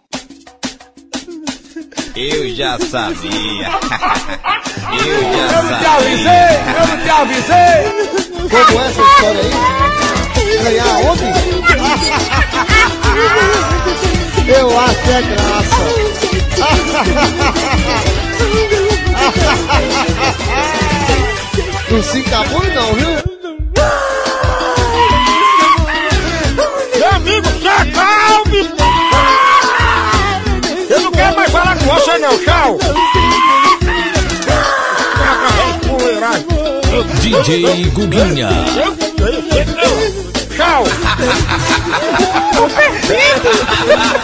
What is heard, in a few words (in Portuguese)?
gritos; campanha politica 2012; zuacao chororo